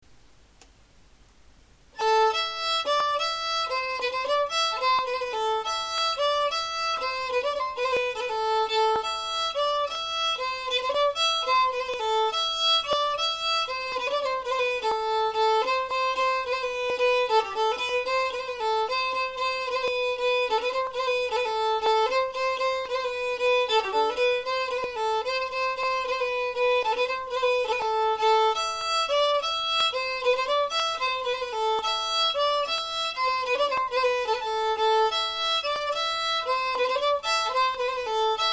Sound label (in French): Bourree du Pauvre Homme Le Theme